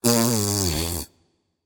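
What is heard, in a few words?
tongue, non-verbal; smite; voicepack; merlin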